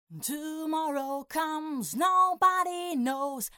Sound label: High Boost